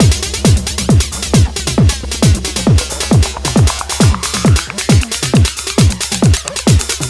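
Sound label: drum loop 135, left; House; Best Service ProSamples vol.10 - House; AKAI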